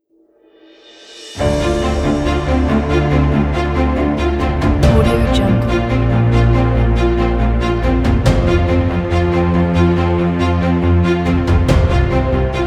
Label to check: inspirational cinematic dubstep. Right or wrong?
Right